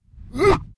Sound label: swordman attack; megaglest